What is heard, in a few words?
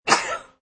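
Lady Cough, Female cough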